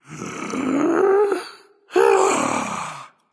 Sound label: breath, voicepack; smite; jorm